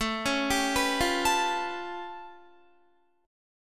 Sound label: A Augmented 9th A, Guitar; A+9 arpeggiated; 16,16,19,16,17,17